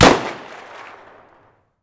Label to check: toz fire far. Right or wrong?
Right